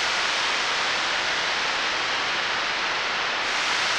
sparse soundscape samples; ReLooped

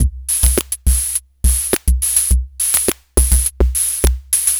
Funky Machine 104, Lounge Drumz

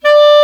Clar mf D4; Digital Sound Factory Virtuoso

